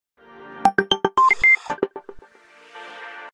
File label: Nokia X2-00 Sms